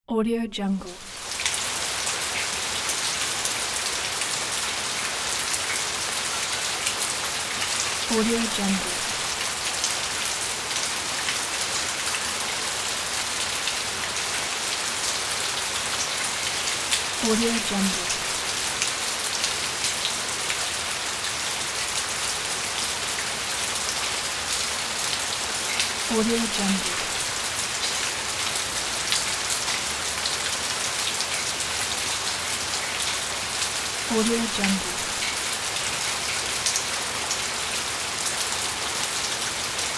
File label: rain